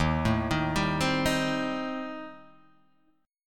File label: D sharp 6th Flat 5th, D sharp, down, Guitar, DADGAD